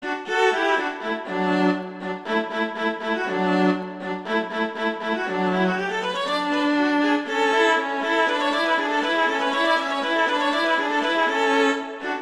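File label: Duet, horn; Mozart; Duets; Vla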